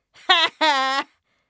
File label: to be ridiculed